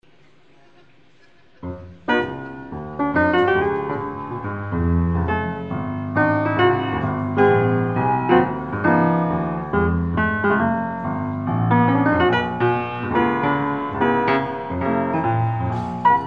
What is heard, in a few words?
pianist; Live-Musik